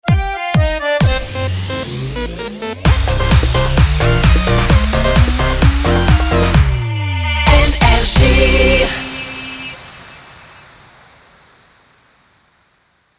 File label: Jingle Ramp; NRJ France; 130bpm